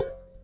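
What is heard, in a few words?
ride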